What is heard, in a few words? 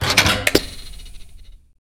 Main lever bad